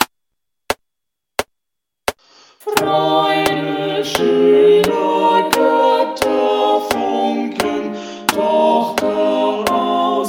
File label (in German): Ode an die Freude klassisch chor klavier klick